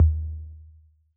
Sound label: analog tom; AnlgKit1